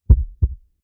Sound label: heart